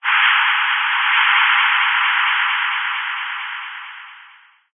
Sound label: science fiction radio receiver transmission interference burst noise distant